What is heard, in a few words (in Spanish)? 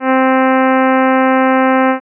serie Armonica